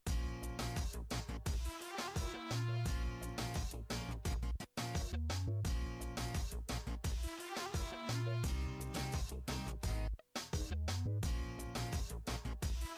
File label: nokia 1661 ringtones journeys